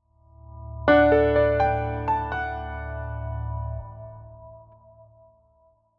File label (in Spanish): AUDIOLOGO; ASOMERCADEO